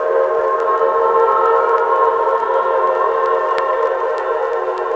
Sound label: Lock Groove Vinyl Simulation; Loop Samples